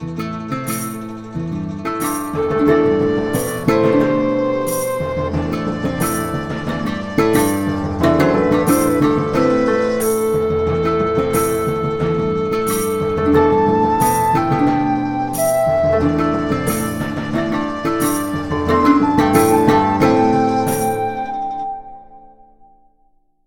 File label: Victorious Swadian; Mount & Blade: With Fire & Sword